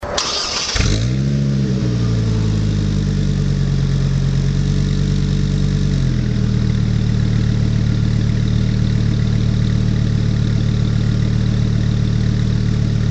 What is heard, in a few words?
gibson; colorado